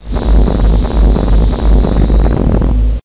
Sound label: ambient roof top war; hl2